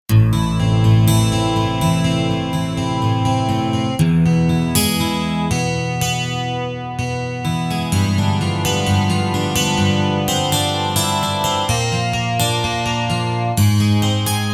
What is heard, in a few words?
12 strings, Roland JV-880; Factory Patches